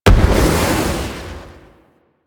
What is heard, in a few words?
Prisoner Explosion; Mission Four; Sentient Narmer; Warframe; The New War